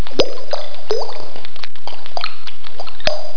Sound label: RAIN